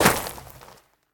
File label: rocks land; Golden Crayon Sounds